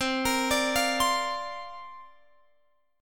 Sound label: C 7th Suspended 2nd Suspended 4th C down Guitar Open F; C7sus2sus4 arpeggiated